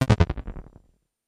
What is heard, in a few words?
error